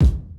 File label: kick, house